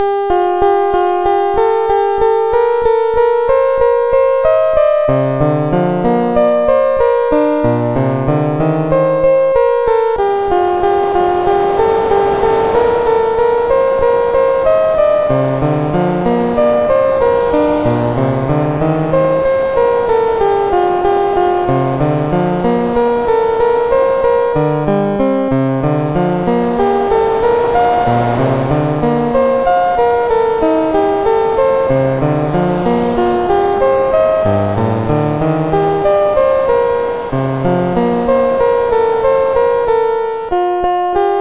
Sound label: Wind, sid CSG8580R5